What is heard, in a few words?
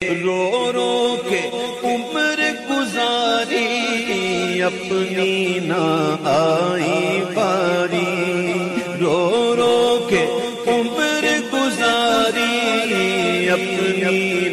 Naat Ringtone